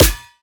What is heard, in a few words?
snare; 7rystan's Snare Pack 2018